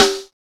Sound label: SNR, Roland; Hybrid Kits; Club-50 - Foundations; Thin